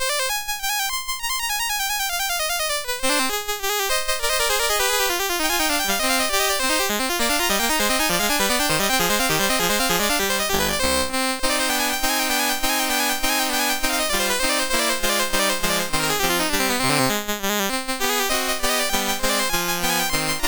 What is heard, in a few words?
Gigue; MOS6581R2